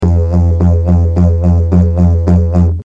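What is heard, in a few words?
didgeridoo melody repeating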